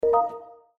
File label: buzzer